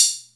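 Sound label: Atlantex Music Percussion Computer hi-hat closed; Atlantex MPC-1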